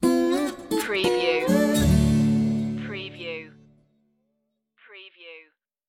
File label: loosy bluesy stinger